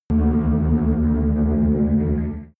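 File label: extrinsic hum, energy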